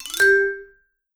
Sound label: VIBE LICKS; Sampleheads - Dave Samuels Marimba & Vibes